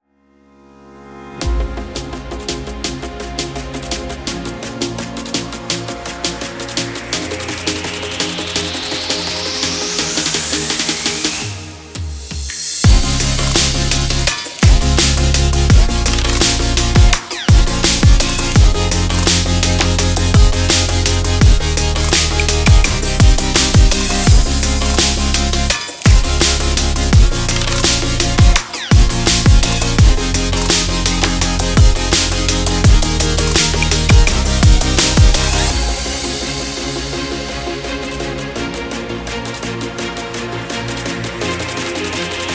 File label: Urban Future Bass